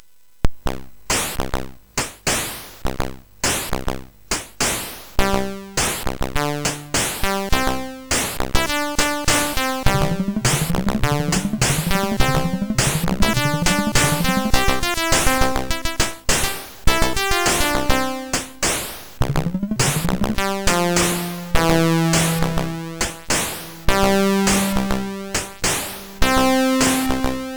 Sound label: Super Synth Sample MOS6581R4